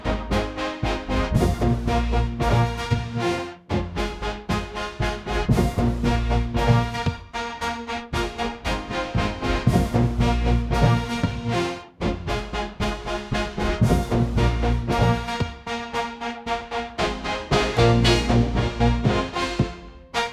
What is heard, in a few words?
military naval march loop